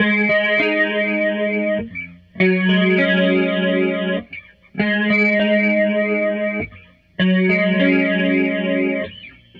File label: Tele Guitar Loop